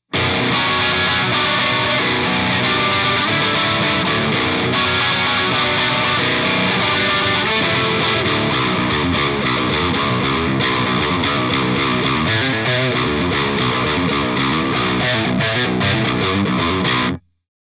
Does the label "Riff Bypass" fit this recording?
Yes